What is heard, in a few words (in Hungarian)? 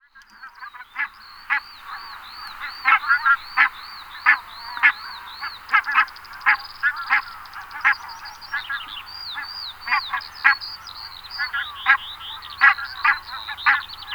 apácalúd Branta leucopsis